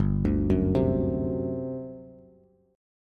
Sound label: G Major 7th Suspended 4th, Bass; GM7sus4 arpeggiated; JGuitar